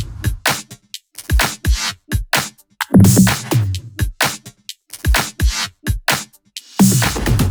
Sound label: 128BPM Kit; Vengeance Samples; Dumbflange Kit